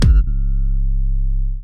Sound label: Kicks, Elektron MachineDrum SPS1 MKII